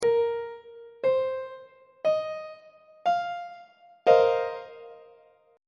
e sharp dominant 7th suspended 4th 1st inversion on treble clef